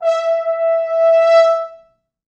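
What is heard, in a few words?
French horns, four, e4; East West; Quantum Leap Horns Sax; 4 FrHorns fts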